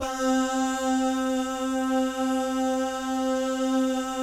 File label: BAH GUY, Jazz FX; Spectrasonics; Vocal Planet; JAZZCOMBO